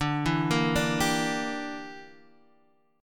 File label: A sharp 6th Flat 5th